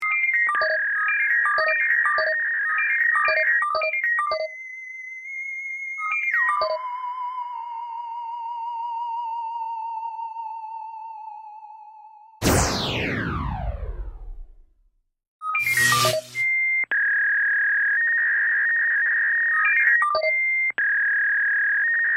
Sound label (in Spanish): sonidos audios robots laser galacticos; stars wars